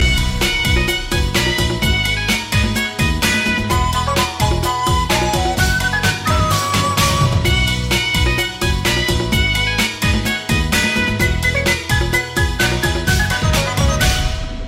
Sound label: asian music